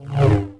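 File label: sith lightsaber Swing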